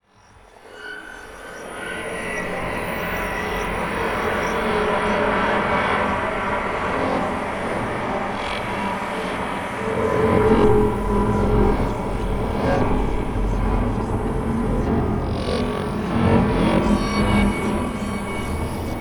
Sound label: Atmosphere Sci-Fi; MatiasMacSD; SCI-FI ZONE CONSTRUCTION KIT